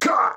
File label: death; Paladins; Androxus